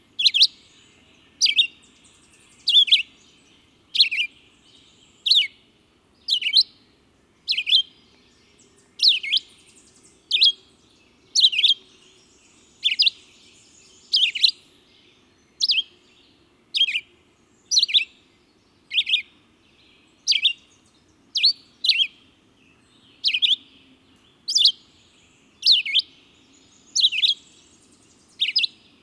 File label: Red-eyed Vireo